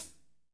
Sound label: foot